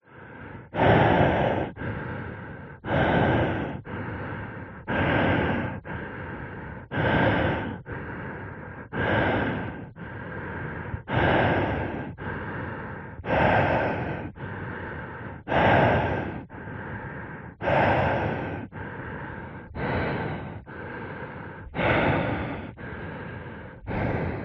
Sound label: human breathing underwater or space subjective intense to calm